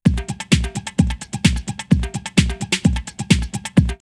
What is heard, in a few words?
Congaz 129, drumloops, Techno&House; X-Static Goldmine